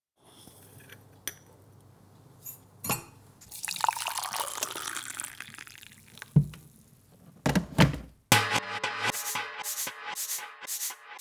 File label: Kitchen Drumkit mixed